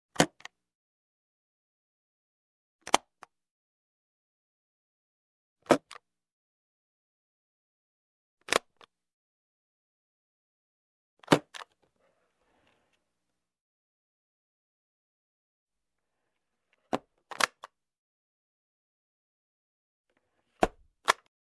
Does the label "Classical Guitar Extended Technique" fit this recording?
No